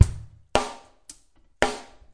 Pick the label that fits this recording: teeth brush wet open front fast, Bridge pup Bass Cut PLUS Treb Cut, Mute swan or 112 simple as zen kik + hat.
112 simple as zen kik + hat